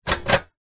household portable camping stove gas clamp lever down